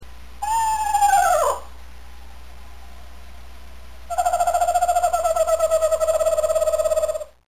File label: laughing owl